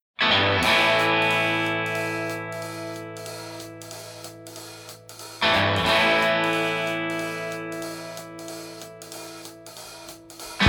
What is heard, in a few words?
Blues; Bunkagai